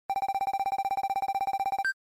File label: NES Shooter Score